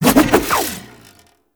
Staff Spin Swing; Warframe; Duviri; Dax; Weapons

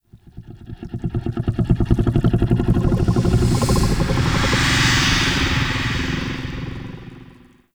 Face Close Up; Sacrifice Arsenal; Cinematics

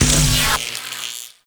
Corpus Arachnoid Camper Shield Spawn; Warframe

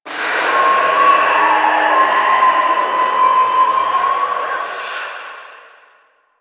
Exterior Foreground Infested A; Warframe; Ambience; NineteenNinetyNine

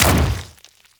Ancient Tank Step Default; Infested; Emissary Ancient Tank

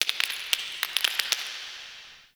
Zariman Stone Debris; Warframe; The New War; Ambience

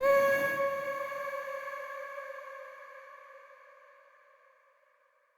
Statue Voice C Sharp; Player Instrument; Statues

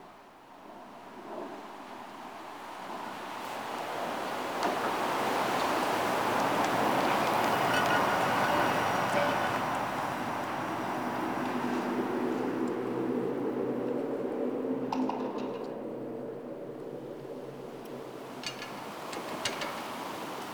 Winter Exterior Medium Bed Loop, Ambience; Warframe; Nineteen Ninety Nine

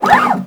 Jackal Leg Servo, Machines; Warframe

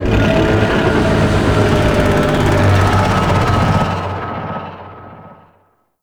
Void Dragon Vocal Idle; Enemies; Vocals; Warframe; Duviri

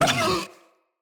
Kubrow Feral Vocal Pain

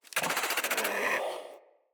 Infested Charger Idle

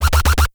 Alt Fire Growl; Infested; Infested Arm Cannon; Coda Bubonico; Weapons

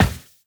Run Glass, Footsteps; Warframe; Tenno

